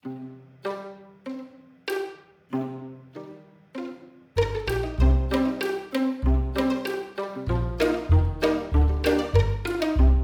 pluck machine

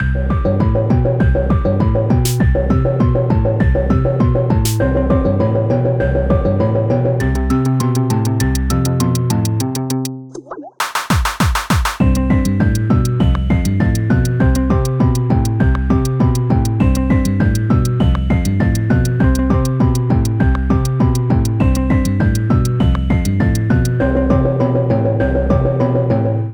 A cutesy soundtrack; underwater arena

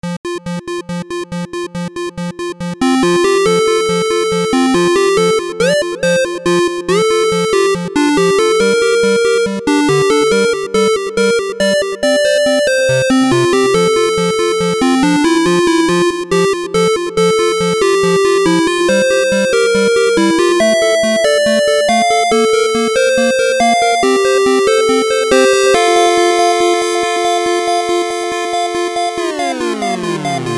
Happy 8Bit

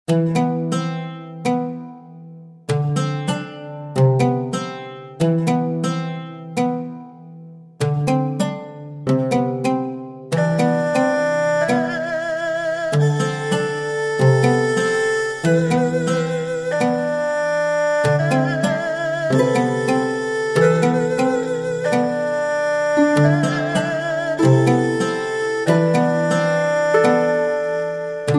asian duet

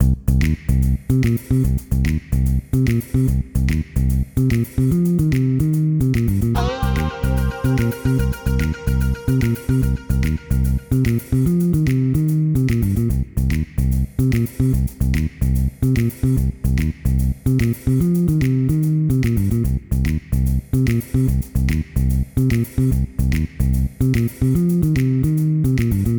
Noire Crime Time

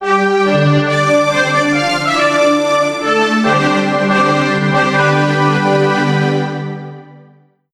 jingle

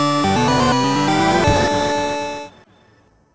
fanfare

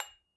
ding soft, dings

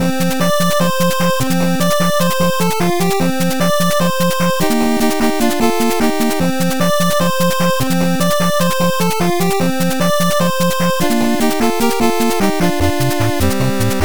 8bit action title bpm150

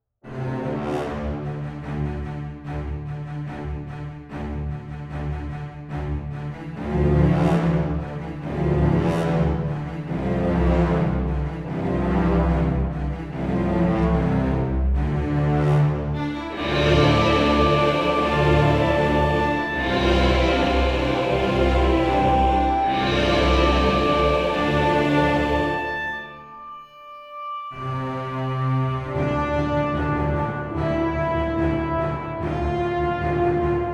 Final Epic Battle

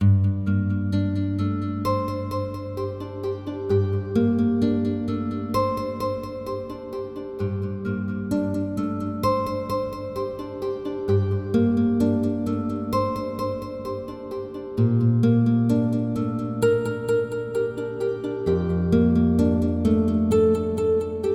Peaceful Place

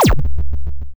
chicksword anger shoot

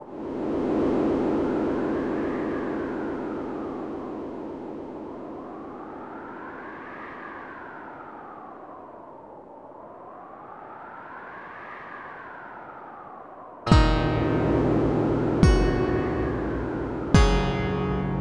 frost pass

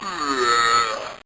enemy death, bitcrushed voice one shots

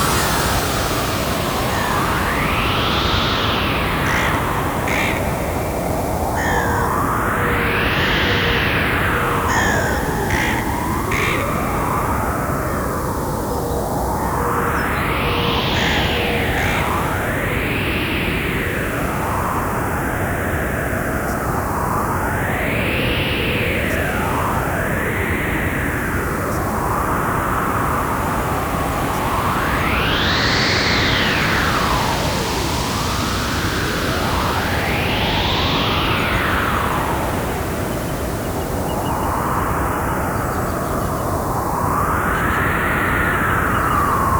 desert ambience; Space Captain Yanya - Original Soundtrack

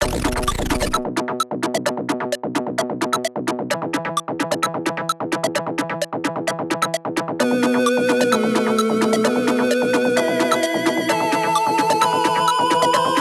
Chiptune; The Challenge

